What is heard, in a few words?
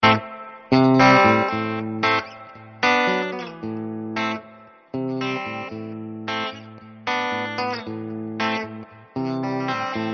Vox AC15 Tele